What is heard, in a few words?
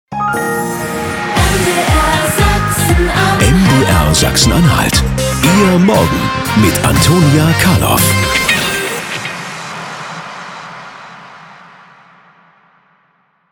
Show Opener